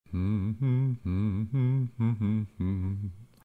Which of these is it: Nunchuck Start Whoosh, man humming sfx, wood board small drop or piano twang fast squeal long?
man humming sfx